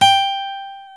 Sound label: GTR, Dual Guitar, Roland; Club-50 - Foundations